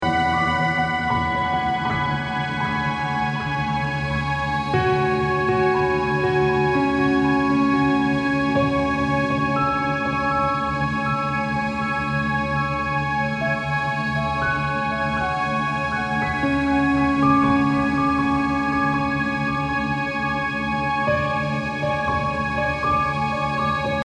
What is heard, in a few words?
Healing Melody